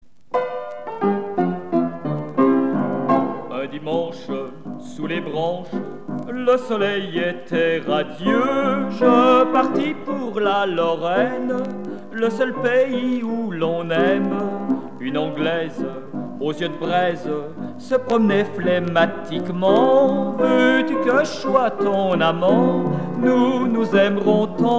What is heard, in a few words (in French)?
Chanson paillarde